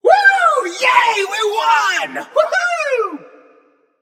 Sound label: nv yay; smite; xbalanque